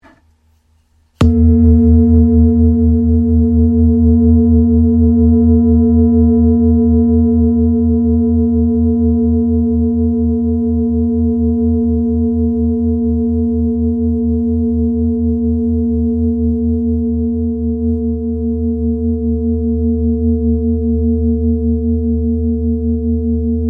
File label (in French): Bol chantant full moon mi